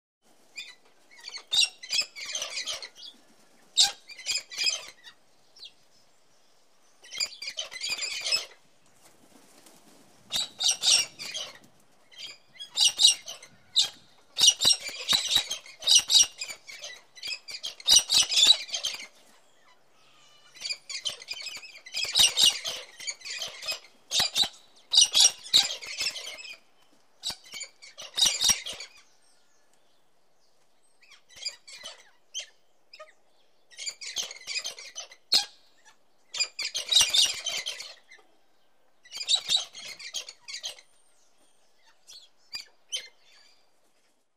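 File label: cockatoo parrot makes sounds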